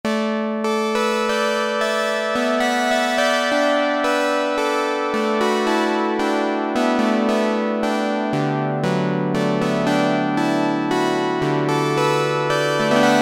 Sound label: LONG CHIMES, Oberheim OB-8; Factory Patches